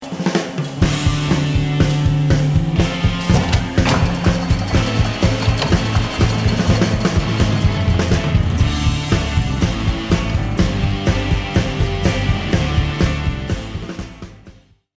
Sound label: construction; manufacturing earplug headphones